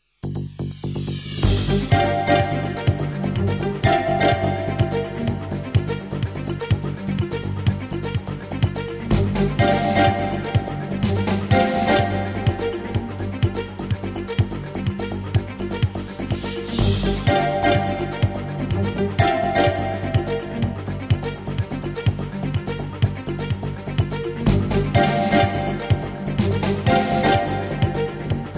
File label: jingles; 90 DDD Jingles